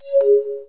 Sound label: cancel